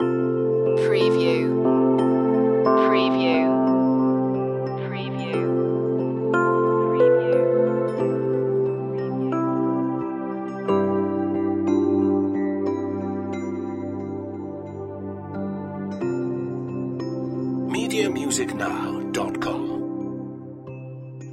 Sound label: angelic healing loop